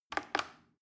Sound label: plastic button little click regular fast